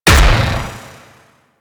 Spear Gun Explosion, Weapons; Warframe; Corpus

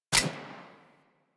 Arch Dual Pistol Mech, Weapons; Warframe; Archwing

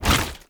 Tank Infested Arm Move Fast A; Warframe; Lasrian

